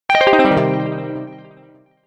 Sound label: Alert Guitar; HTC Touch Diamond